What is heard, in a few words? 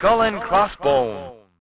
Level Intro; Skull & Crossbones